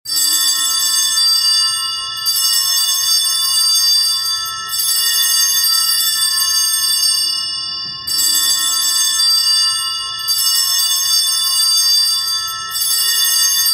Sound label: sanctus bells ringtone